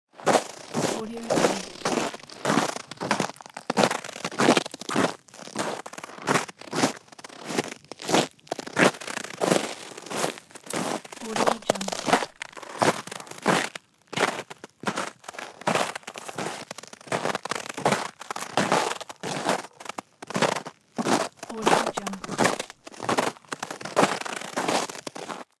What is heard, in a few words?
snow crunch